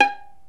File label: PITZ STRINGS